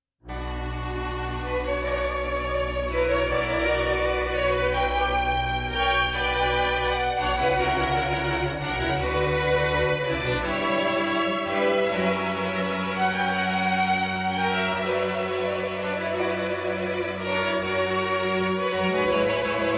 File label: Orchestral Suite in B minor - Ouverture; BWV 1067